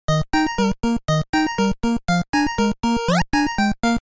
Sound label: pong